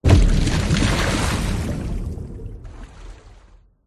water whoosh impacts movements; george gazetis

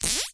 leisure toy buzz rattle magnets click vibrate

